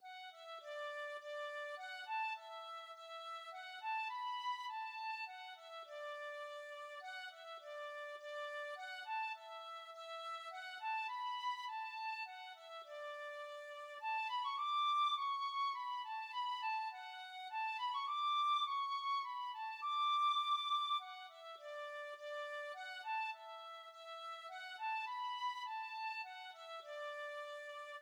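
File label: penny whistle, tin whistle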